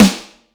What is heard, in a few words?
snare, techno 138 BPM